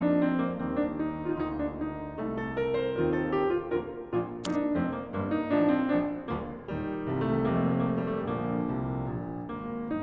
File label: piano